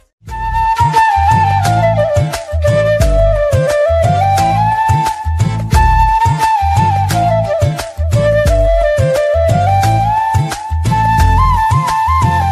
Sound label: latest music ringtone, bollywood ringtones